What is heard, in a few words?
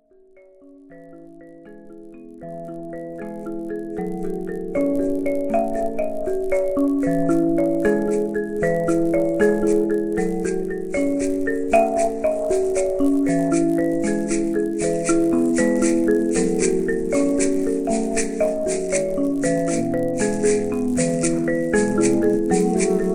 GENTLE mbira